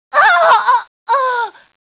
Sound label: die